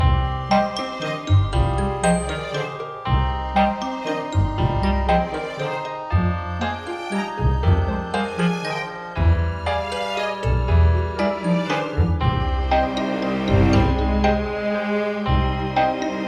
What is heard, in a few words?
Winter Working; Don't Starve Giant Edition; PS Vita